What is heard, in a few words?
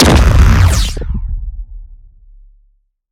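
Sci weapon Shot Large Laser Planet Destroyer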